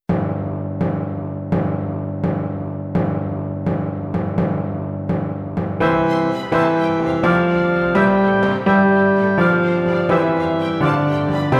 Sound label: Ode to Joy Slow